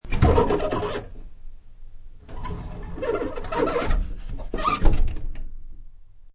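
Folding Closet Door Open Close, Folding closet door creaking open and closed; Part of Closet Doors (Sound Effects:Doors)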